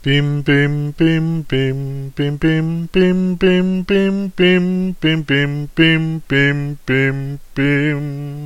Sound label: God Save the Queen